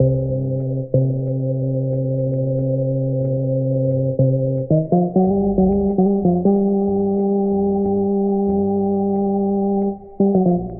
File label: fake funk, Loops Electronic